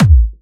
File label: Bassdrums UK Trance Kicks